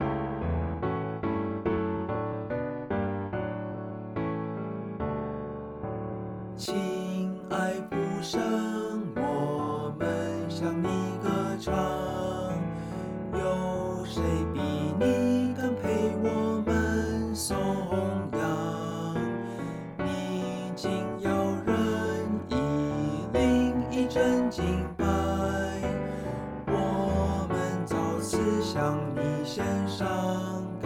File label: vocal; Hymns; Chinese